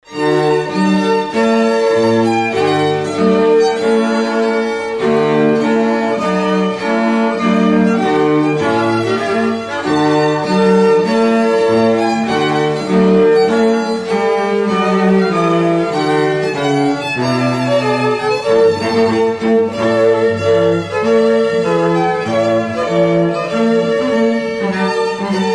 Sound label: trumpet tune